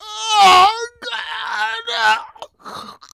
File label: Voices weightlifter scream